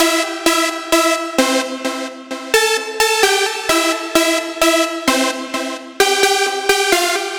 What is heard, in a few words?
Dancer Lead